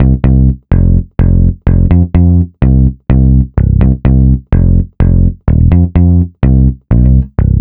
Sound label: Root C 126BPM; Vengeance Samples; VEH3 Special Sounds